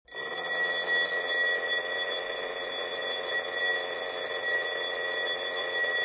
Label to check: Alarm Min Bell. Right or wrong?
Right